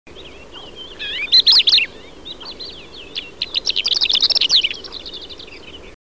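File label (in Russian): malyy zhavoronok golos, short-toed lark voice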